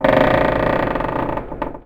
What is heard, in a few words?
wood creak; WAR